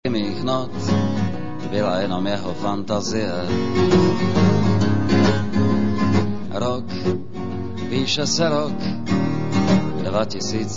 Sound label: Sci-fi song